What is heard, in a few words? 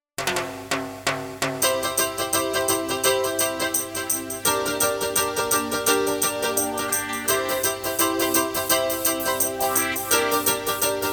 Holiday Reggae